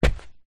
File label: footstep barefoot single on worn carpet